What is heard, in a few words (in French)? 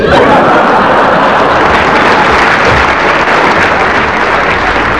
rires